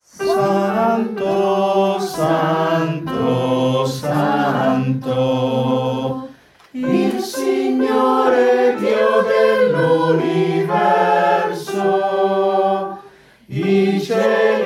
UOMINI e SOPRANI

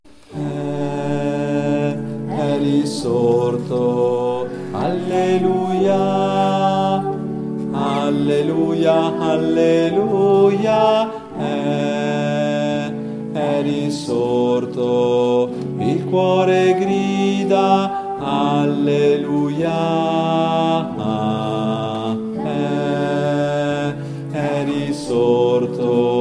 E Risorto, bassi

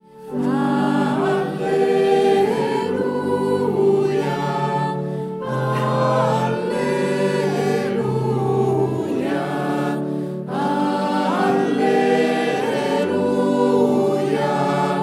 Alleluia Irlandese con Strofa B

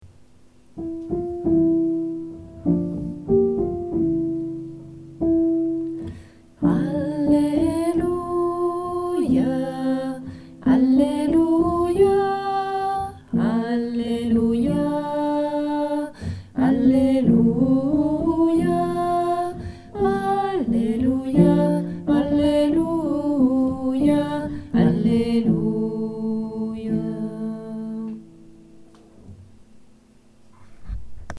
Alleluia Lode Cosmica, bassi

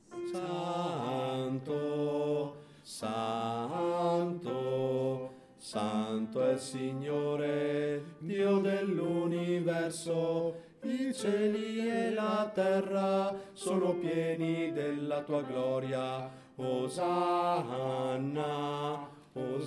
BASSI; Tempo ordinario

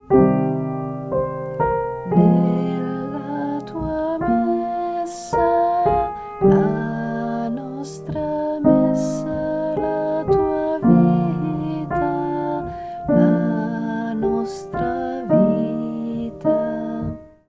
BASSI; Quaresima e Pasqua 2020

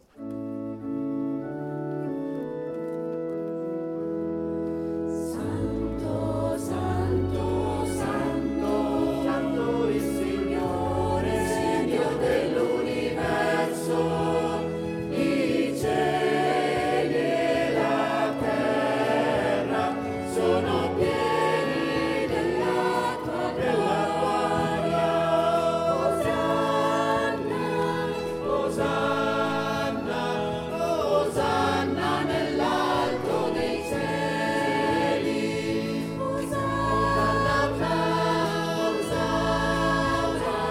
Santo; coroLAB

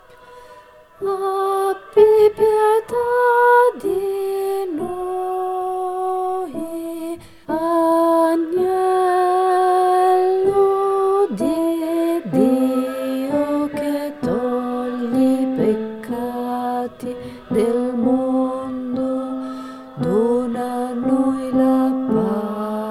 bassi; Agnello Di Dio; Giovedì Santo 2025

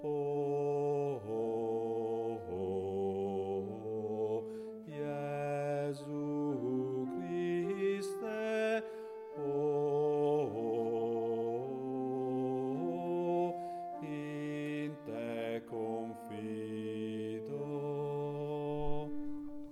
In Te Confido, bassi

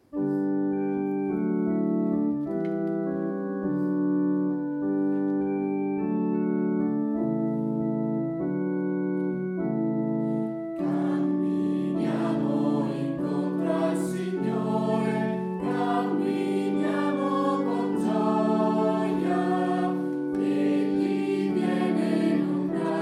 Camminiamo Incontro Al Signore

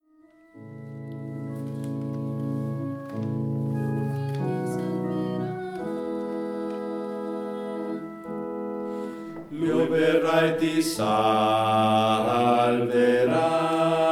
bassi

